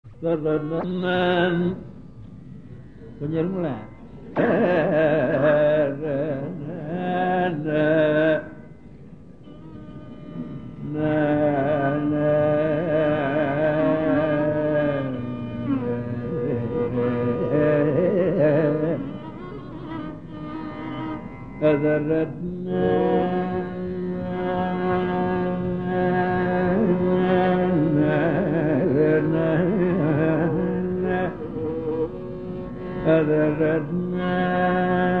nilambari alapana

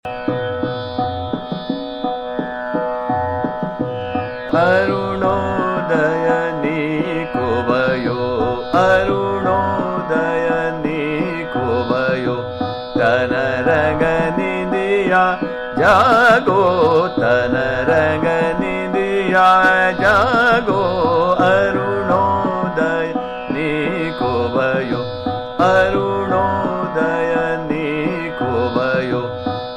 Chota Khyal - Ektal Drut, Bhairav; Arunoday Neeko Bhayo

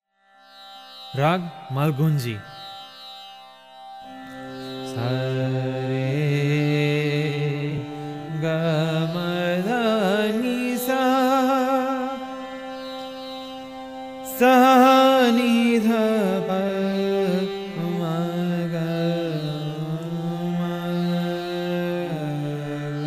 malgunji, raga

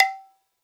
khol na

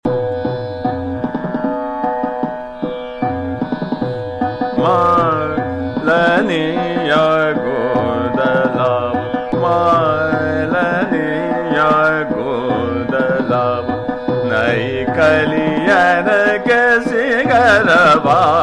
Chota Khyal, Ektal Drut; Puriya; Malaniyan Goondh Laao

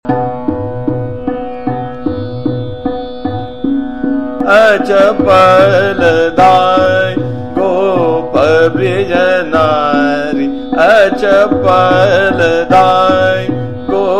Chota Khyal, Trital, Jaunpuri; Achpal Dhai Gop Brijnari

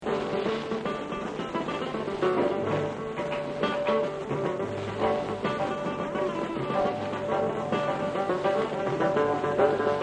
chaunnukhan kamod

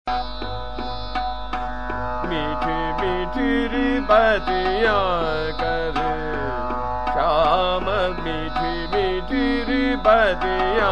Chota Khyal, Trital; Tilang; Meethi Meethi Ri Batiyan

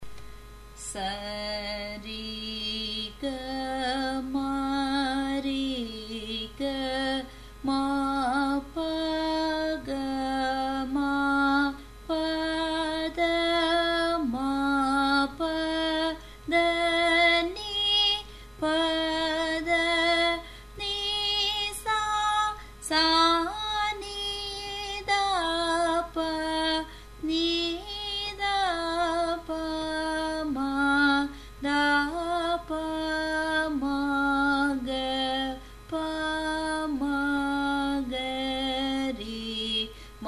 Eka Talam G sharp; Alankaras; Carnatic Music Basics

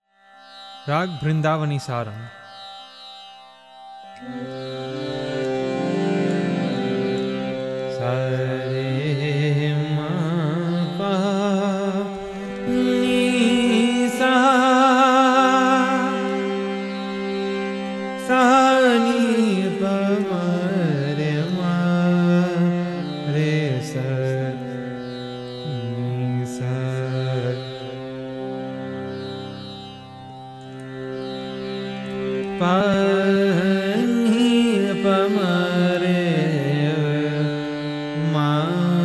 vrndavani saranga, raga

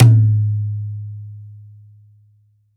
dhin, khol

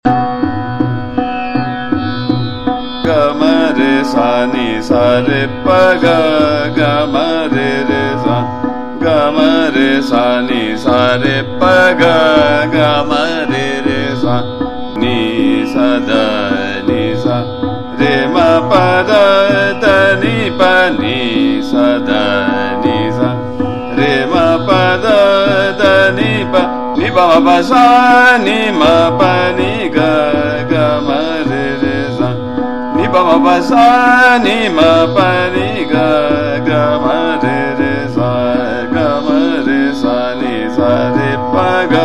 Sargam, Ga Ma Re Sa Ni Sa, Trital; Darbari Kanada

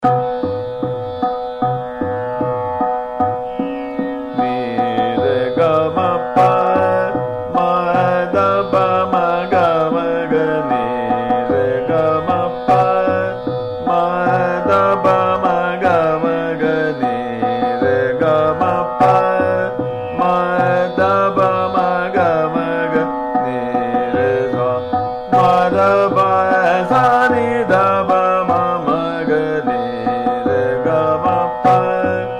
Sargam, Ni Re Ga Ma Pa, Trital; Purvi

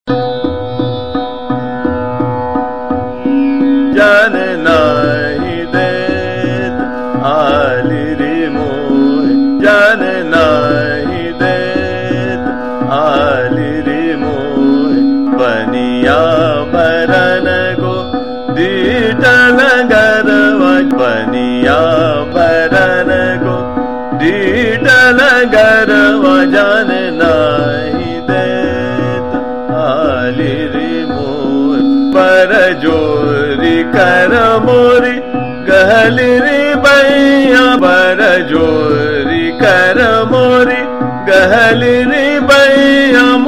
Chota Khyal, Trital; Bheempalasi; Jane Nahi Det

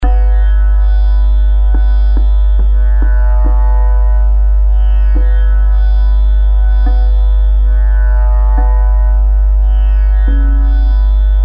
Bada Khyal, Tilwada; Durga; Beeti Sari Rain Tanarang Rasiya

